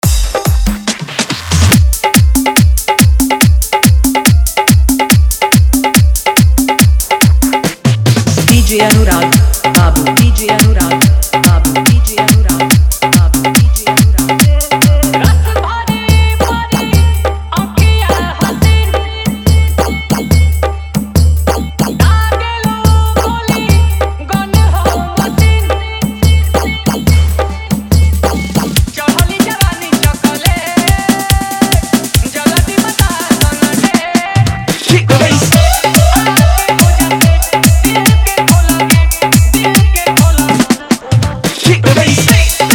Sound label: Bhojpuri Dj Song Hard Dhollki Bass Remix